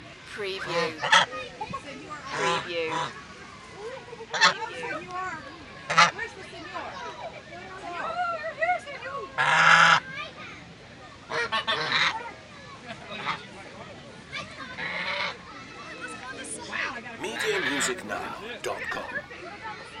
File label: Geese County Fair